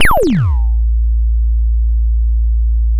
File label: sound of a bike bell